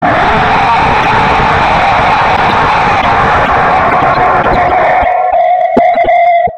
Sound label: attack; slender; laalaa